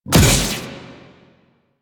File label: Hammer Shield Block C; Crp Hammer Shield